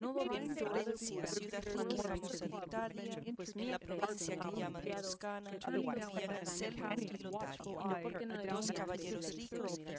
female inst sim; SASSEC07

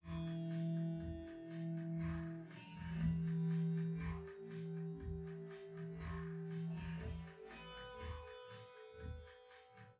wdrums

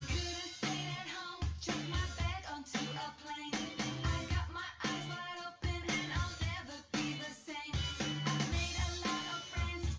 test wdrums synthconv 250ms 5cm src; SiSEC08; SiSEC underdetermined

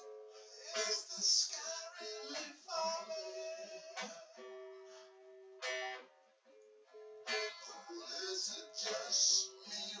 nodrums synthconv

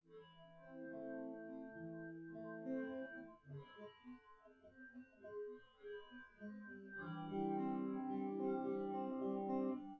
no drums synth conv 1m sim; SASSEC07

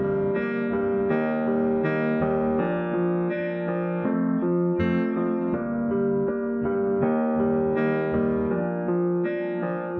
no drums, inst

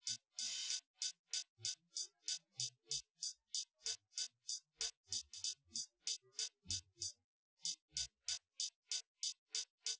wdrums inst sim

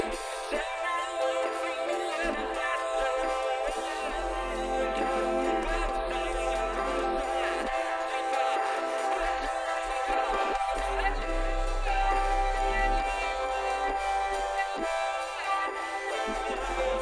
piano; SiSEC11

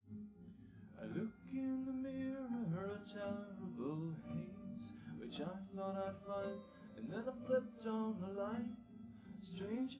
nodrums liverec 250ms 5cm sim

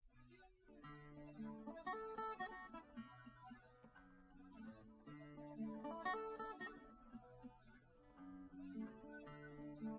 no drums, live rec, 250ms, 5cm; SiSEC08; SiSEC underdetermined

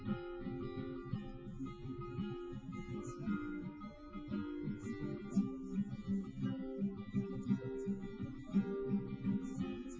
nodrums live rec; SiSEC08; SiSEC underdetermined

